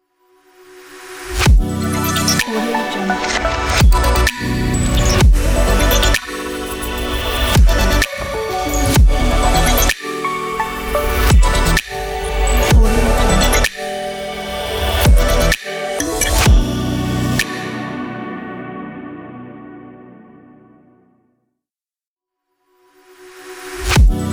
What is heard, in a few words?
modern tech logo